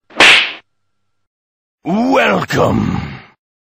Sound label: slap sound effect